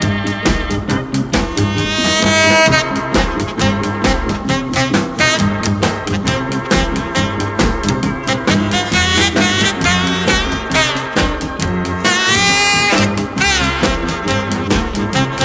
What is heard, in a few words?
tic-toc